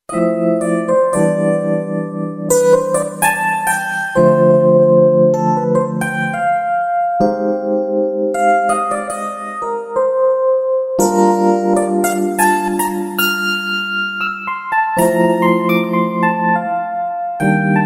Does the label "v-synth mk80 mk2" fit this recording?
Yes